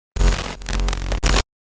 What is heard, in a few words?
glitch